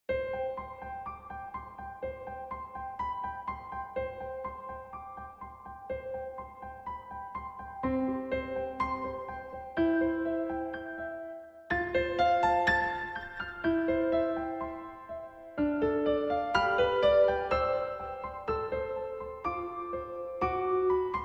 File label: Twinkle Lullaby Twinkle Little Star, Piano